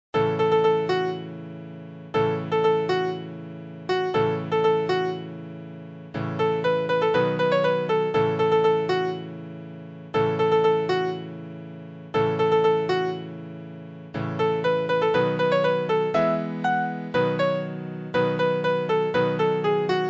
Piano

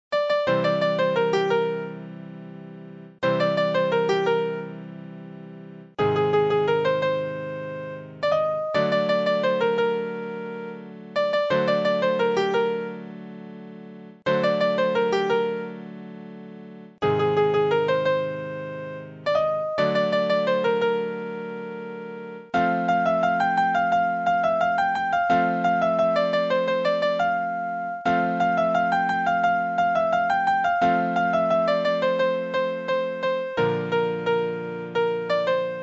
Piano

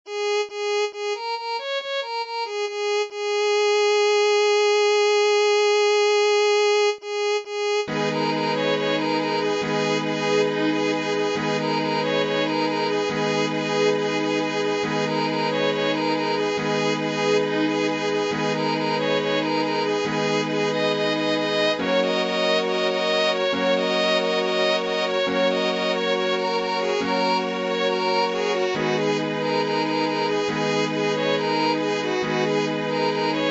Violin

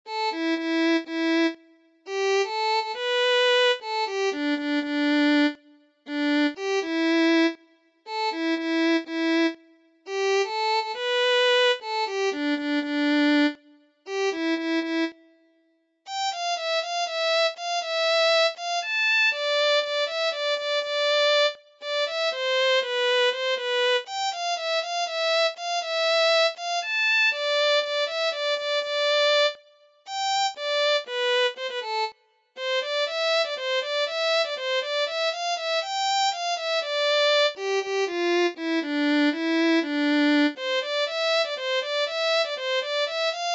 Violin

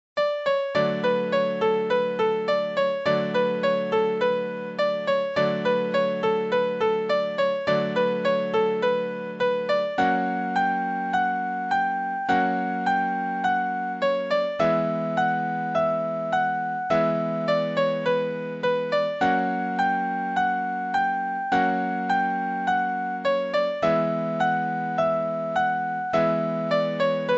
Piano

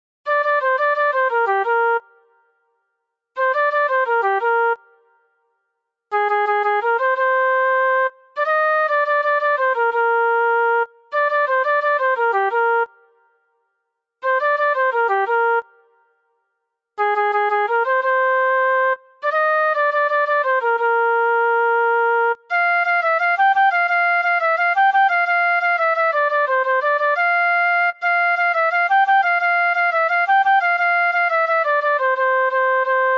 Flute

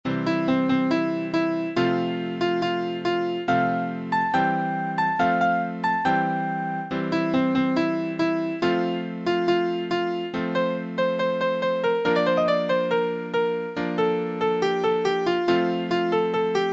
Piano

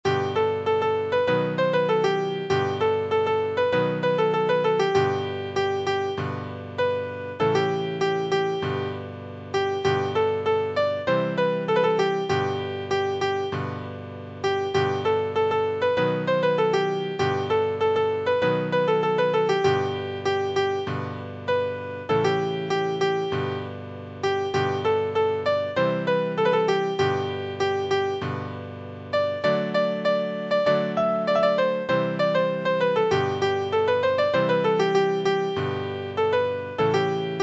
Piano

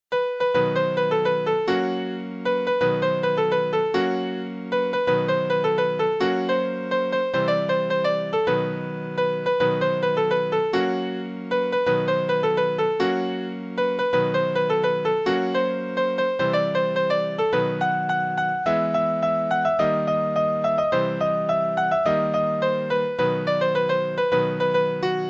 Piano

